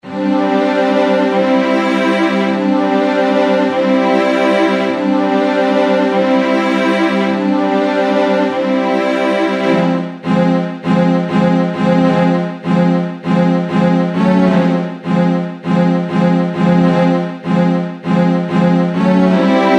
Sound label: strings; HALion6 : Studio Strings; Surrealism